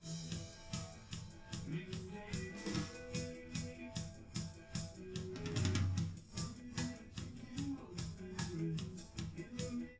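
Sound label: wdrums live recording 250ms 5cm, underdetermined; SiSEC11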